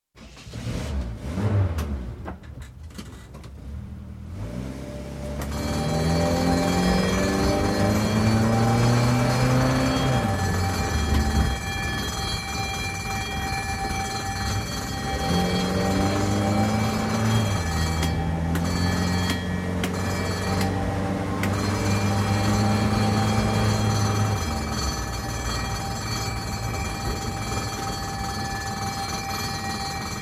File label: Ambulance, start into constant with bell (not used these days) slows, stops; Emergency Vehicle Sound Effects; BBC